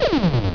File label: FAIL